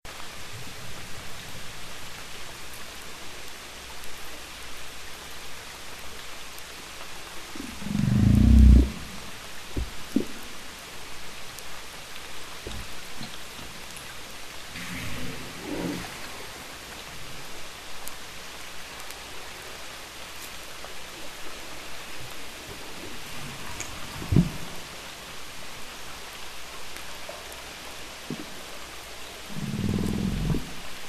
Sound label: Humpback whale wheeze blow